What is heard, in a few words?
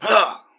Swing; doctor